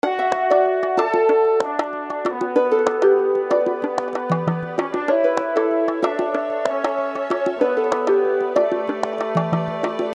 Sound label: transition soft